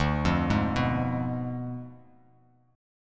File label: D Diminished 7th D down Bass Standard; arpeggiated